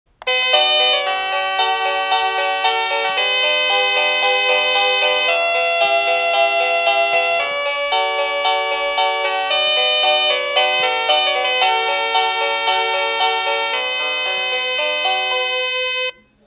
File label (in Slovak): Ukážka melódie zvonenia zvončeka